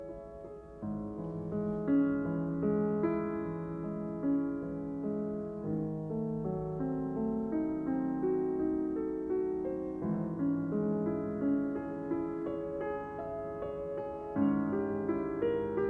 The movement goes on with its restricted dynamics and ostinatos, waving like a sad sea.; Beethoven Piano Sonata No. 14 Moonlight Sonata